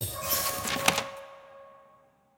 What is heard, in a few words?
receive gift; ceremony; tft lny2022